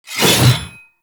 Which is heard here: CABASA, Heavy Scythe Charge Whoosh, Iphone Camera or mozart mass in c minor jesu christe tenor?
Heavy Scythe Charge Whoosh